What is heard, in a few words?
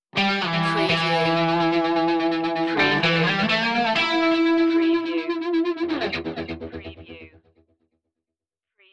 dirt stinger